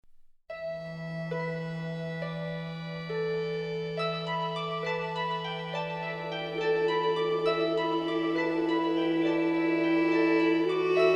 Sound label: Bass Clarinet